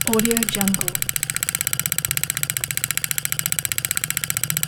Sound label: synchronizer spin 35mm film rotating with break; 35 میلی متری; interface sounds